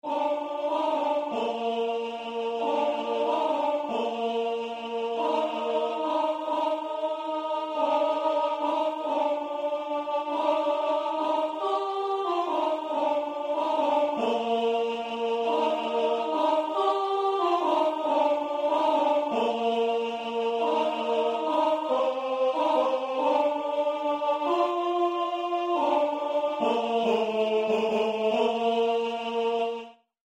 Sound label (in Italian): stille nacht contralti; Silent night